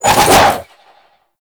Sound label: Arch Wing Sword Spin Whoosh; Warframe